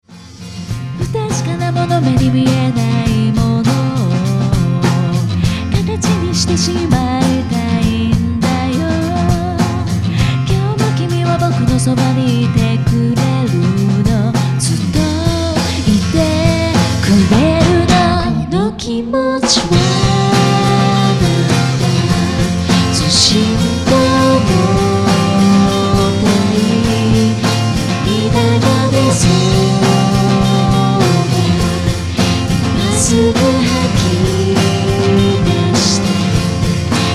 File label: melody short